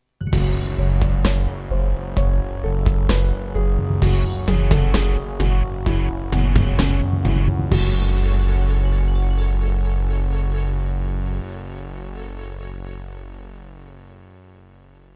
Roland SonicCell GM2 TEMPLATE; Doom (Sigil); Introduction

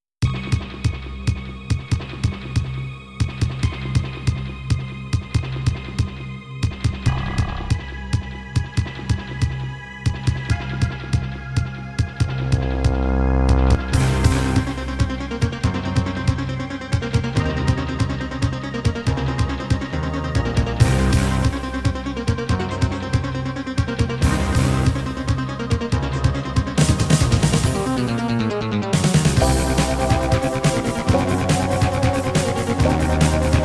Kawai GMega; sigil doom episode 5

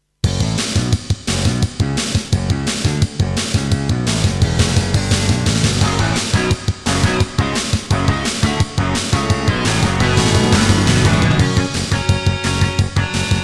Tascam Pocketstudio 5; Duke Nukem 3D

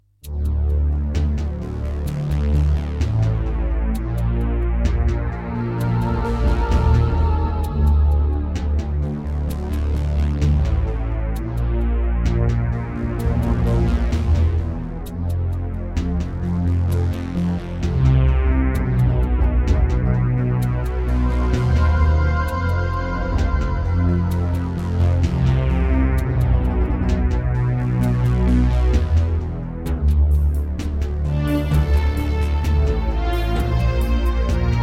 Terratec MiniWaveSystem WT64; Descent II - Briefing

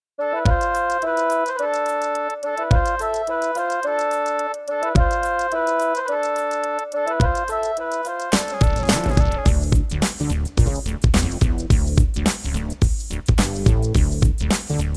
Yamaha MU500 GSMODE

